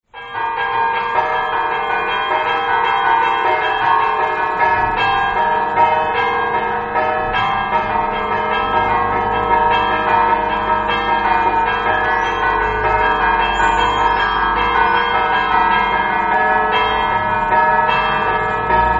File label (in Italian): campane; gurise